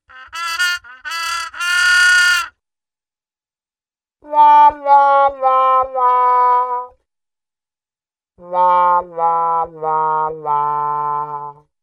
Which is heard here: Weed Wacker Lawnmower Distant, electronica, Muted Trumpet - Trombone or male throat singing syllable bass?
Muted Trumpet - Trombone